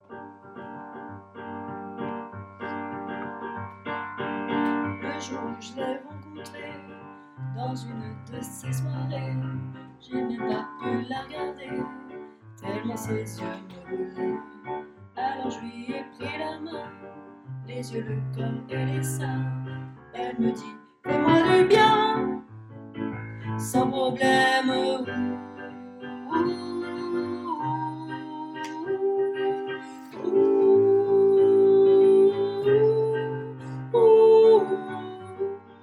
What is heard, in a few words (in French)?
Soprani partie; pupitre soprani; enregistrements de travail